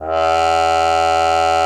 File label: cup mute E2; Xsample VOL-3